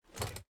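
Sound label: footstep armor; smite; kuzenbo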